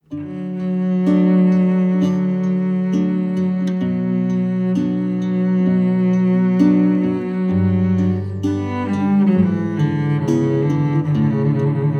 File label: Air In D, Guitar and Cello; recorded at FTM Studio in Denver Colorado